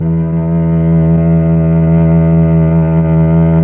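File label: Mellotron Cello E3, Slow Cello; Keyboards of The 60's and 70's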